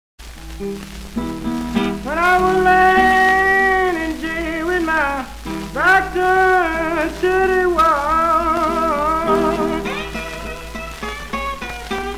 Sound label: blues song samples